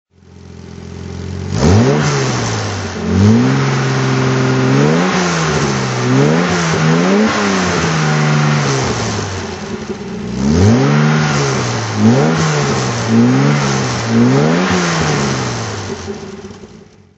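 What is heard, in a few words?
jaguar e type 42ots throttle, Engine sound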